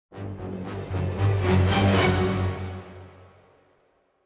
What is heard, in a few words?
Sinister Stinger; littlebigplanet karting ps3 gamerip 2012